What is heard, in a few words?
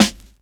sparkly snare one shot g sharp key; Duration 0:01; Mono; snare drum samples